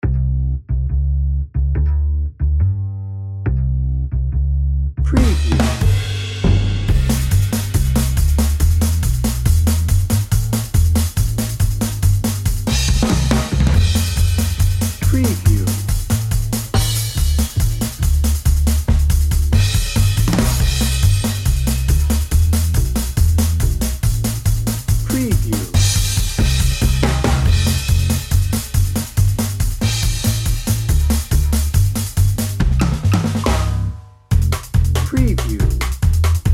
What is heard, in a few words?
dnb